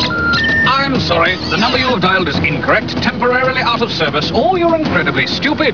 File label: Number Dialed